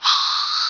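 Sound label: squeak hunt, zombie rat; hunger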